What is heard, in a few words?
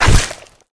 elite bodyfall; flood